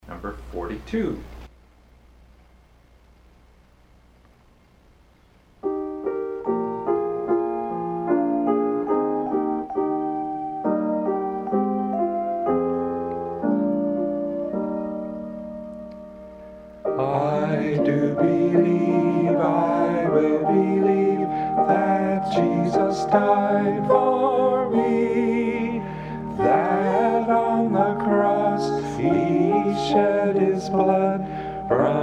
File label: Hymn